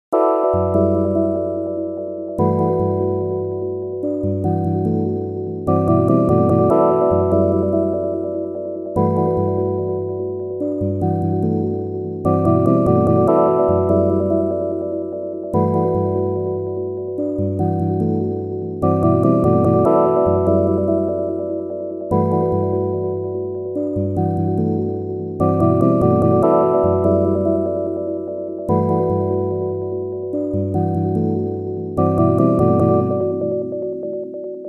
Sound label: sine of life sequence